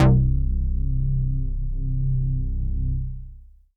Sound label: Synth Prophet Bass C; Electronisounds